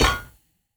barricade metal deploy; Rust

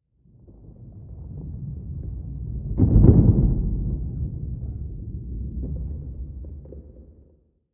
earthquake rumble

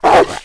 Wolf Hit Vox; Civ4 beyond sword

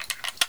Inf Weapons Foley 3rd; Red Orchestra 41-45

